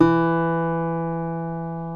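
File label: D2 CLASSIC, CLASSICAL GUITAR; Northstar - New Gold VOL-2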